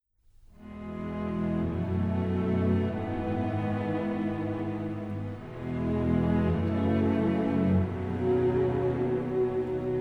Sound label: Chorale